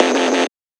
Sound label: synth shot F; Electro; Anthemic; synth shots